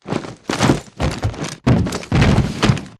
pilot embark casual left; Titanfall 2

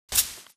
bullet light ballistic sand debris; Titanfall 2

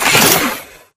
spectre table slam spectre throw; Titanfall 2

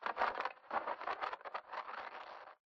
static; Titanfall 2

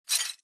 imp bullet lightballistic tile debris; Titanfall 2

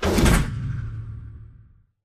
sliding door ext verb open stop; Titanfall 2

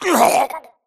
grunt death neck; Titanfall 2

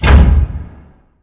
pod door sm verb close stop; Titanfall 2

